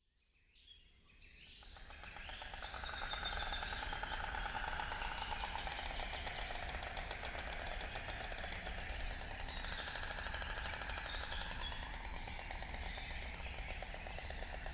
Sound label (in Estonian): Valge toonekurg